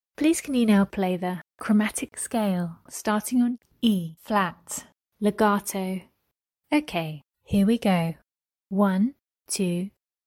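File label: chromatic scale starting E flat legato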